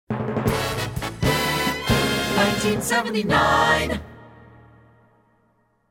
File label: Hits, Drops